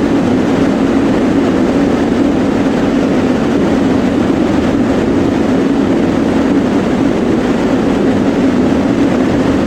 Aircrafts A-10 Cockpit Plane Wind In; DCS

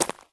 run slate; Escape from Tarkov

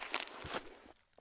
prone gravel; Escape from Tarkov

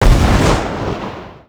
explosion; buraq

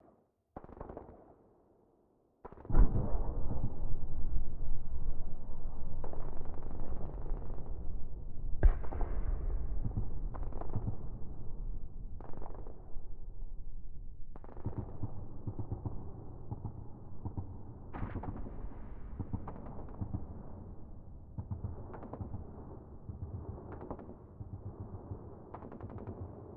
warfare amb tanks low; War Thunder; ambient tanks

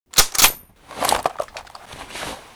Inf Weapons Foley; Red Orchestra 41-45

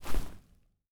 Footsteps Grass; DCS

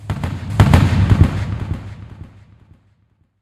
ambient port; World Of Warships; port3d

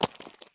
walk slate; Escape from Tarkov

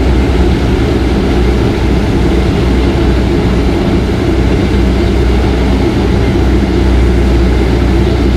Leclerc Engine Idle; DCS

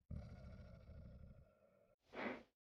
sleeping; Rust

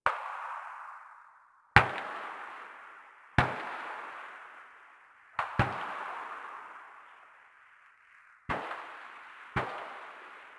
automatic, combat atmosphere; World of Tanks Blitz

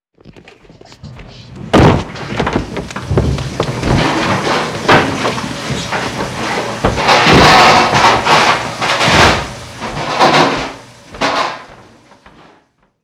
interior scuffle; Modern Warfare

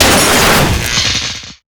transformer, explosions

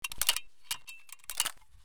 akms stock fold; Escape from Tarkov

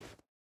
footstep burlap snow jump start; Rust

